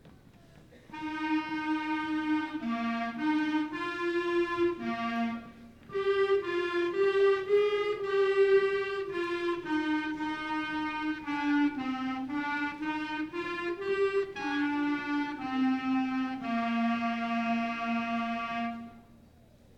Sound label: Clarinet